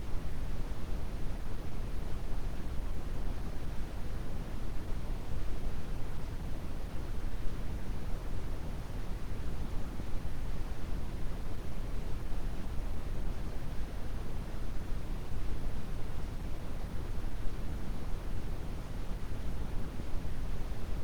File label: brown long quiet, colored noise